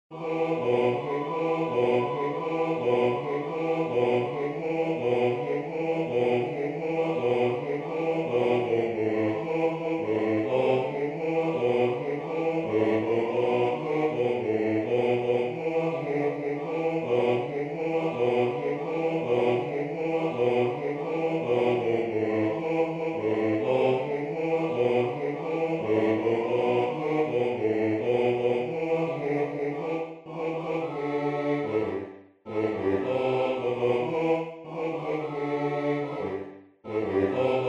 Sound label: bass; spiderman